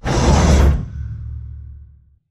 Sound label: pod door ext verb close slide; Titanfall 2